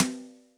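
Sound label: Brush 2 Rim, Electric Snare, Yamaha DTXpress; Brsh2Rim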